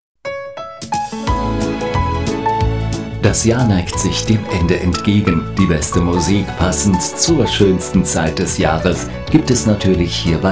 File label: Jingle XMas; Weihnachten 2010